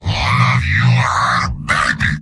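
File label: yell, robot; heavy; mvm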